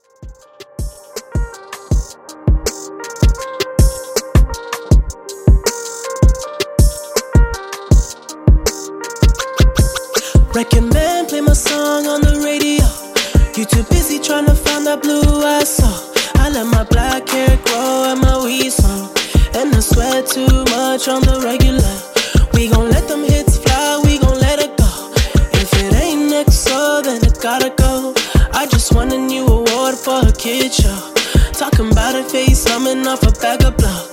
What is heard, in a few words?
RB Redrum 80Bpm